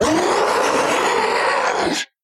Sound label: Jump Scare Vocal; The Town